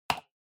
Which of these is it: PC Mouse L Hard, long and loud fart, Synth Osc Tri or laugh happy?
PC Mouse L Hard